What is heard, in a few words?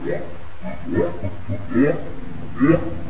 yup yup, Whales